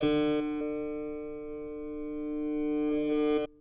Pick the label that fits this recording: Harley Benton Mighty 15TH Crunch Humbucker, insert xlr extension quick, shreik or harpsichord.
harpsichord